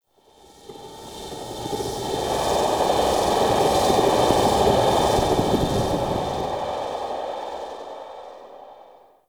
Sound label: Exterior Void Fog; Ambience; Warframe; Railjack